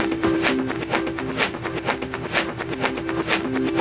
reverse happy beat, 126 bpm, drum loops and breaks